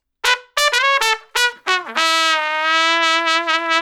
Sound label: 095 Acid Jazz Trumpet _Emaj; Brass; Pro Tools Essential Loops; Digidesign